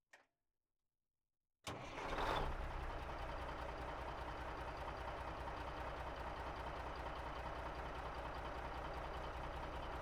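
Peterbilt 359, Onboard, Start, Idle, Blips, Off, Engine, Center; 1971; Pole Position; MKH8020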